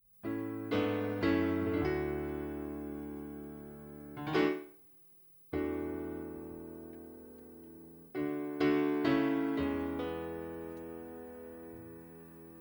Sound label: backing track